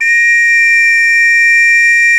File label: 2 NOTE WHIS, World Whistles